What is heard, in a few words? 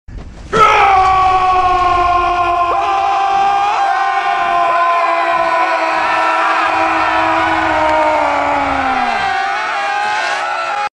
beastly roar; shrek